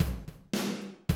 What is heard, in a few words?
110, Classic Drum Loops